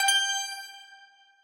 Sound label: countup end; irish pot luck